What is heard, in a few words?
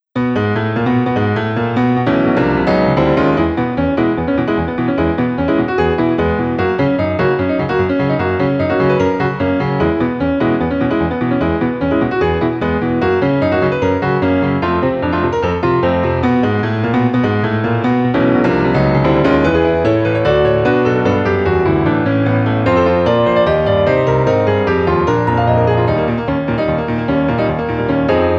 piano; Kirbys Block Ball